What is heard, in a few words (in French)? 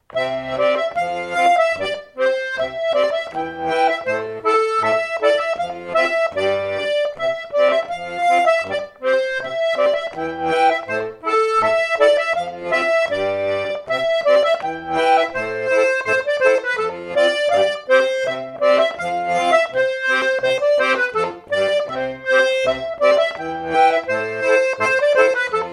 Hanter dro; rencontres-2011